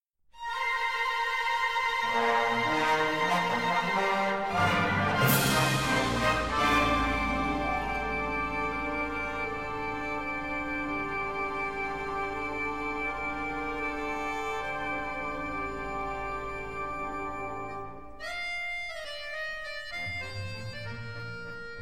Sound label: accordion fantasia